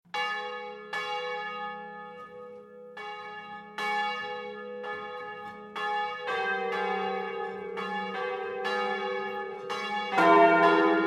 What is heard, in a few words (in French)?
Cloches